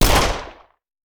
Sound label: Hand Gun; Sound Ideas; Guns